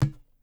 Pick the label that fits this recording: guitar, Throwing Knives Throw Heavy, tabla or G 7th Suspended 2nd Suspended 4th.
tabla